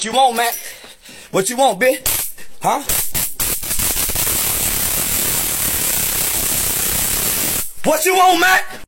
taser; with a switch

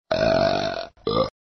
burp sound effect

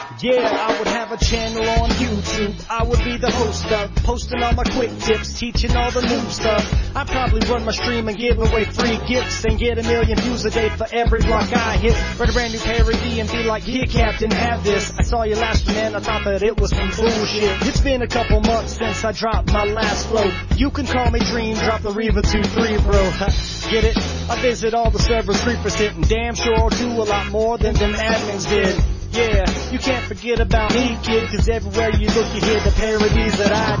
rap

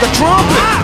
the trumpet

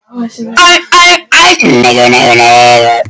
brain fart

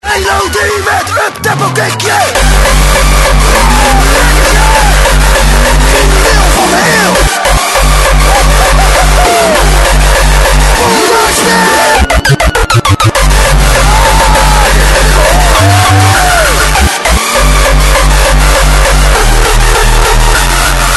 uptempo

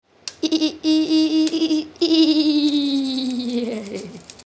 laugh

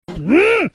mmmm sound

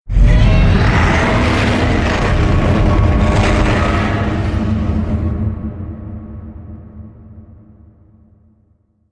godzilla roar; godzilla filius

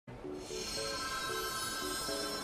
goose; goose goose duck

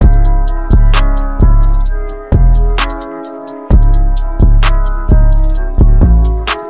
beat

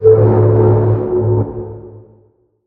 freighter alarm blare; no mans sky

